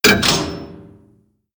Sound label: Floor Elev Countdown